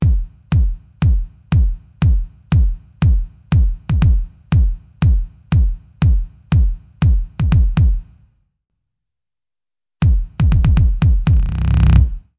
bass drum 120

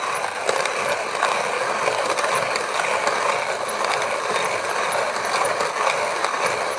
grinding loop

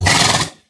snort

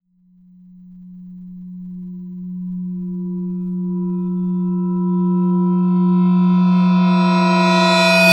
reverse clang

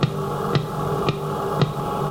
metro gnome

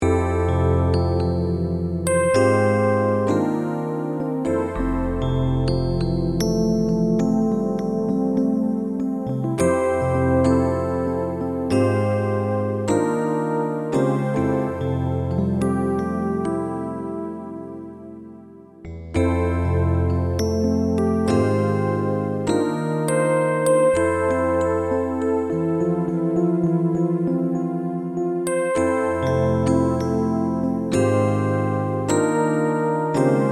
electric piano 100bpm

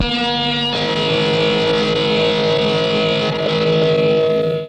lowfi rocker

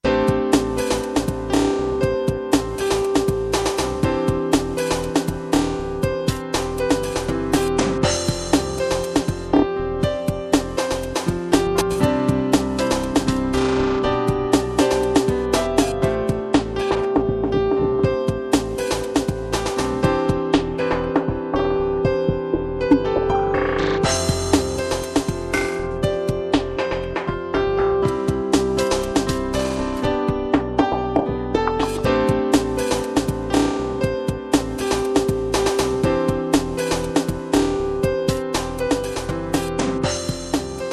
electribe vs u220

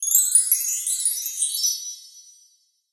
chimes fast rise

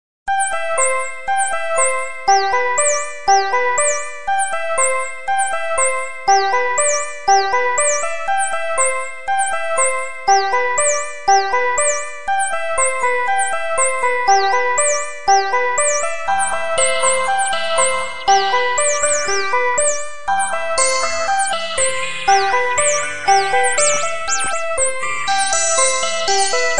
silly birds

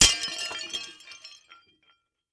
slow smash

